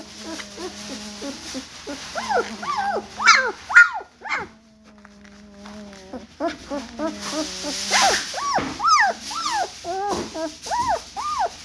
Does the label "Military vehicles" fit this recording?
No